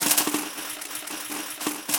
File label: Effects; Screwjar; Ableton; Granulator III